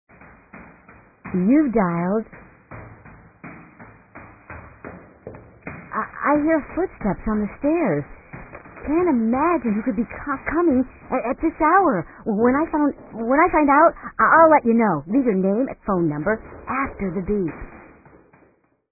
Man Climbing Stairs

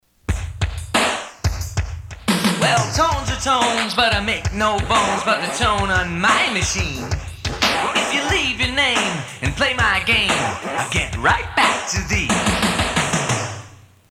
Telephone Tones Rap; ATT Telephone Tunes

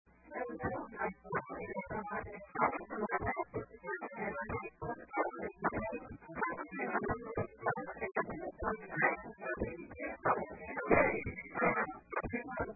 Rap

